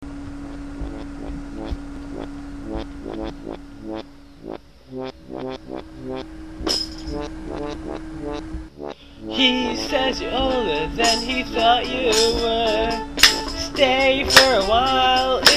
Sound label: lead bass